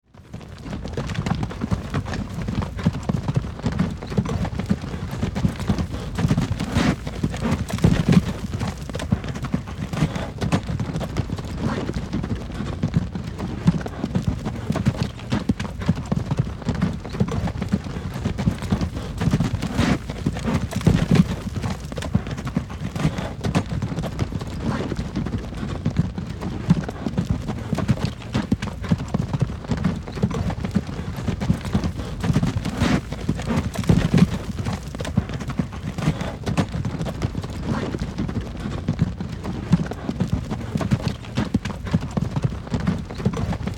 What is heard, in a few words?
Vagon; el colmillo de cobre